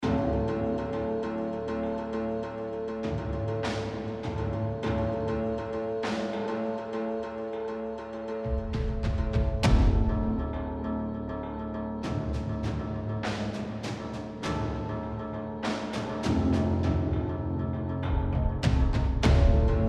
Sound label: Tension Combat